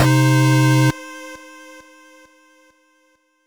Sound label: Retry Jingle, Pixel